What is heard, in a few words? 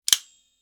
Multi plier close